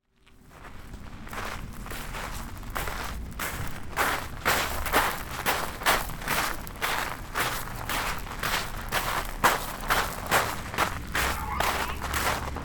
jari wo aruku; Chino Cultural Complex

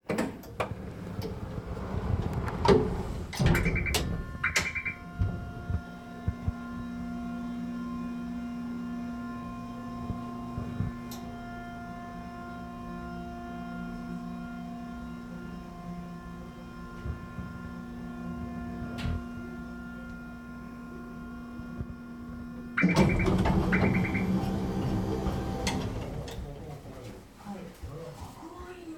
hoomu erebeetaa no sadouon; ホームエレベーターの作動音

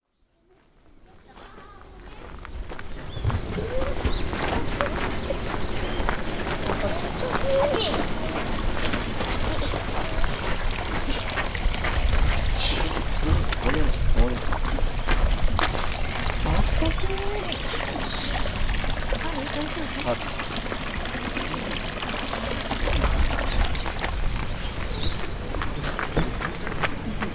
sandou wo kudarinagara; 参道を下りながら（諏訪大社下社）; suwataisha simosha

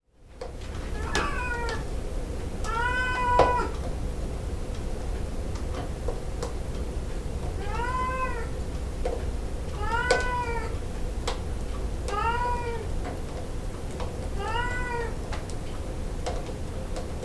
soto ni dase to tobira no mae de naki tudukemasu; 「外に出せ」と扉の前で鳴きつづけます

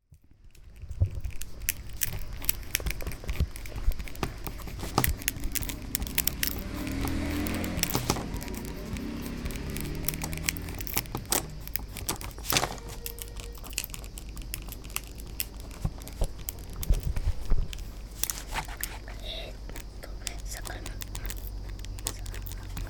enpitsu; kikushima; Chino Cultural Complex ARCHIVES

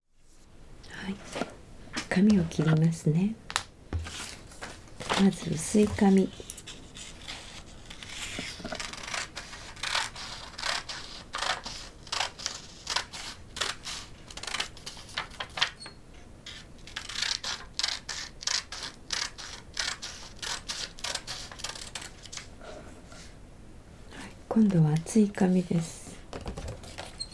kami wo kiru oto; 紙を切る音; きれいなはなこ

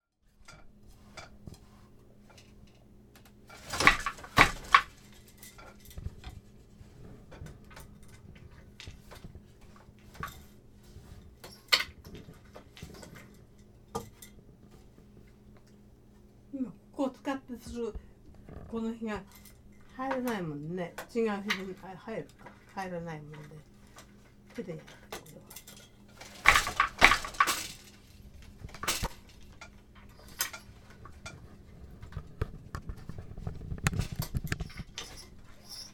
82 sai no obaachan ga hata wo otteiru; 82才のおばあちゃんが機をおっている; 緑のちょうちょ; 機おりの音

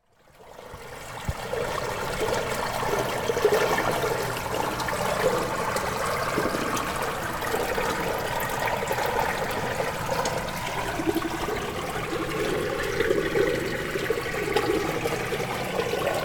kawa kara seki ni nagarekomu mizuoto; Chino Cultural Complex

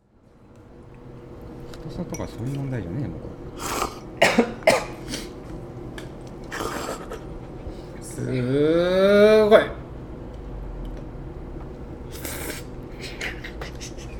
soba susuru oto; そばすする音

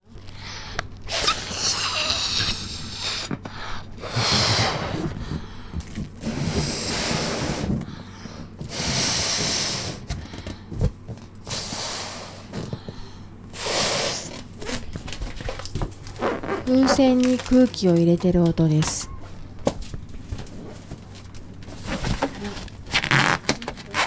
fuusen ni kuuki wo ireru oto; ふうせんに空気を入れる音

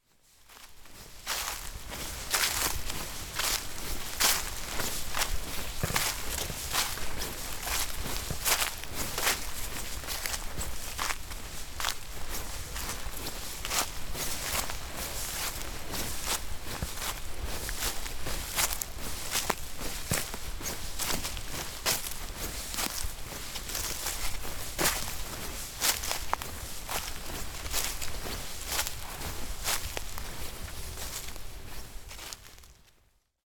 晩秋の森「落ち葉踏む足音」; 耳をすませば

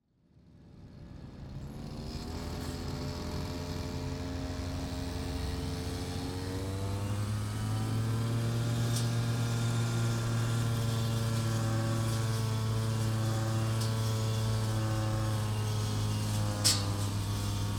kusakari on; Chino Cultural Complex

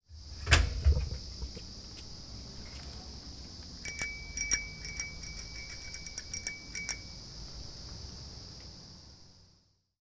jitensha; Chino Cultural Complex

